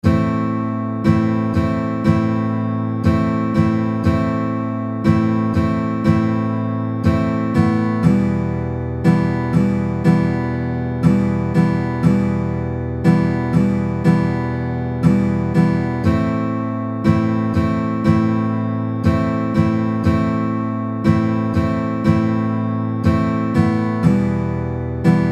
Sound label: Am Am Em Em at 60 bpm